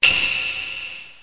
drip; tnpdemo

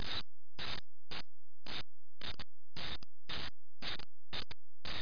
rachet

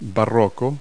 BAROQUE

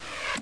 Windoid close; Stanley's Sticker Stories (1997); Edmark

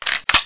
UZI; sw demo

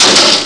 Gunshot; eldritch

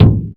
Percu; aloneinthedark4

